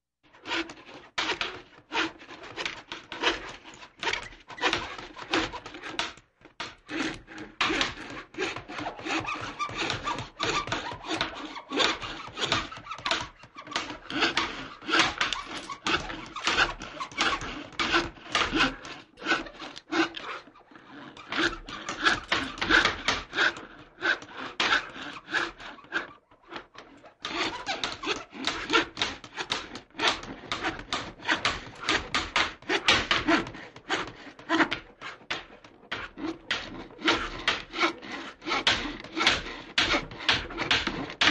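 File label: Sawing with a hand saw, there is knocking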